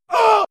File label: damage; happy wheels